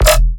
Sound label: Bass Shot E; sickrate; siik essentials iv